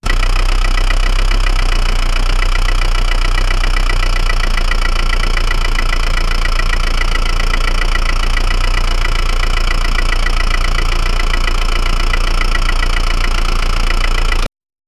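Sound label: noise of diesel fiat ducato, vehicles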